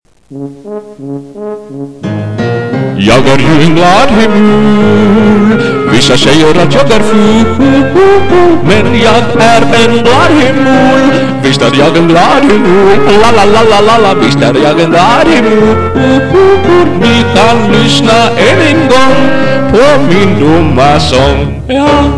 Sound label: song; hemul